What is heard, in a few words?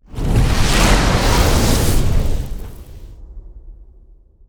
hell wild collect; netent